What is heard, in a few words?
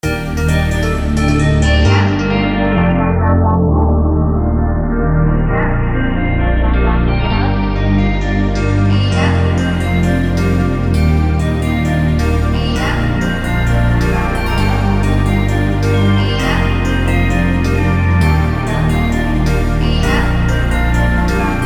Phonks